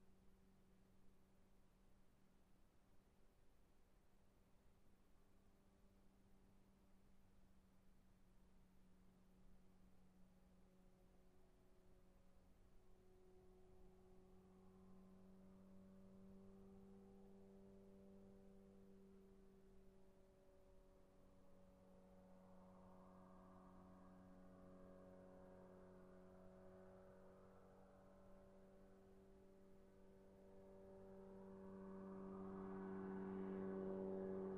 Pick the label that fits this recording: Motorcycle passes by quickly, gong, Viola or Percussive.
Motorcycle passes by quickly